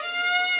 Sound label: violin